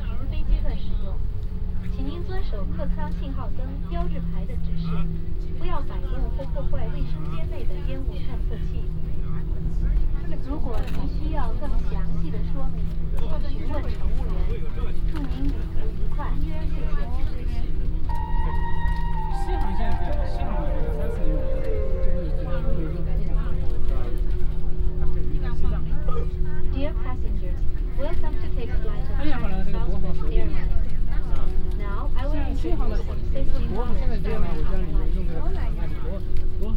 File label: China Take Off Chengdu To Beijing; Sound Scapes